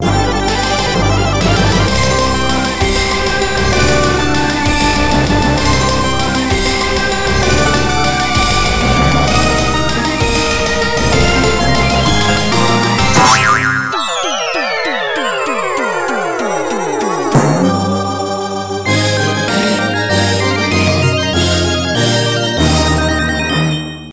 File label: Bells & Whistles (Title, With SE); konami; sunset riders; arcade